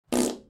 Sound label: fart